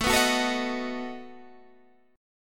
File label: A 6th Suspended 2nd Sharp 11th, guitar